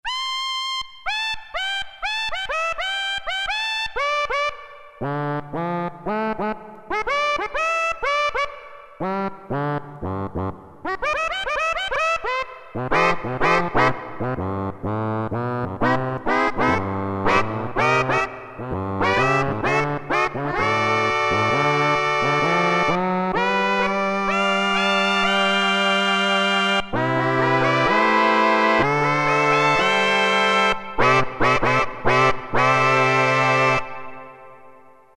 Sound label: Funny Vox, Roland MT-32